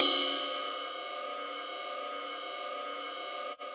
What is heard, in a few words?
Yamaha CS6, Drums